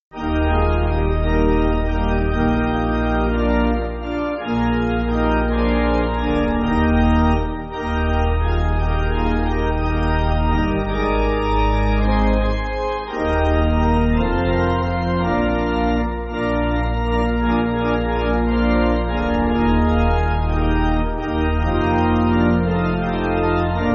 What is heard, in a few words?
Pipe Organ, Music Backing; J.H. Burke; Eb